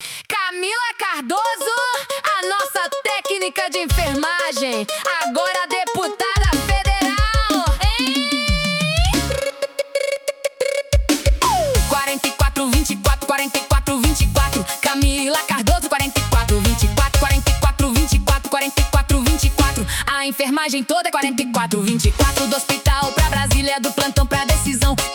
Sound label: jingle